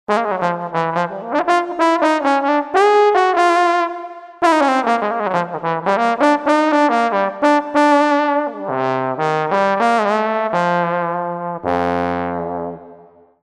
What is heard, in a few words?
Brite Tbn; TURBO VL